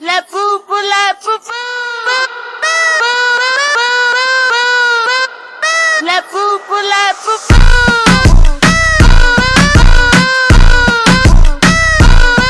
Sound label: reggaeton super slowed